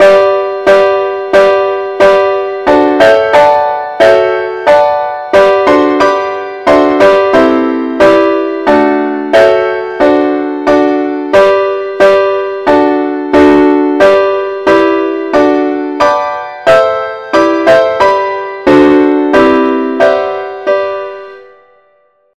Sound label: compare Chords; SAINTTHOMAS